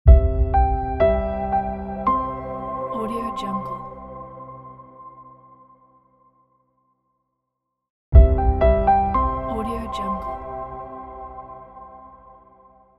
corporate piano logo; musicante

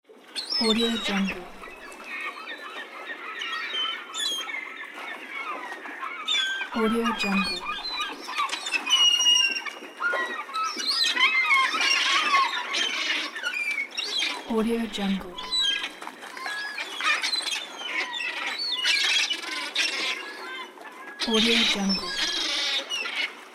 seagulls

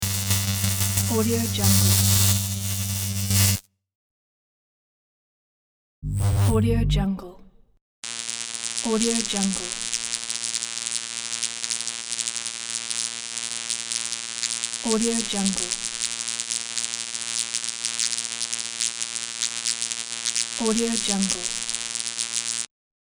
magnetic field

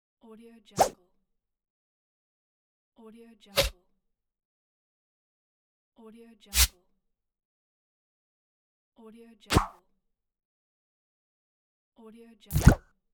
swipe

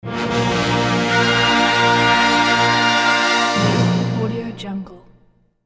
tada movie reveal

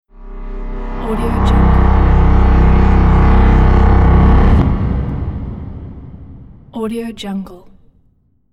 tension buildup

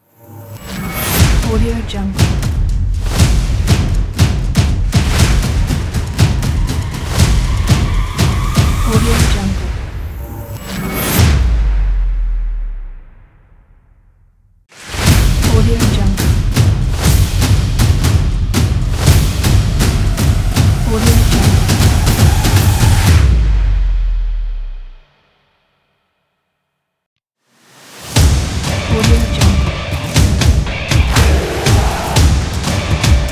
hybrid drums logo pack

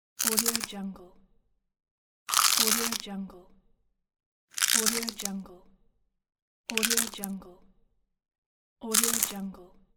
crispy crunchy bites

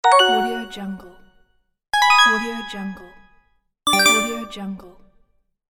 the correct answer

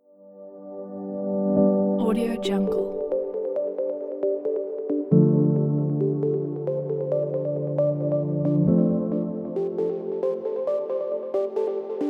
abstract technological ambient

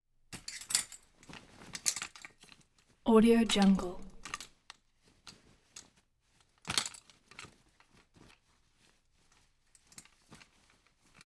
clothing on rack; prosoundfx

